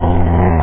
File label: mount; sfgame; mounts